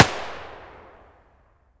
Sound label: adar fire outdoor far; Escape from Tarkov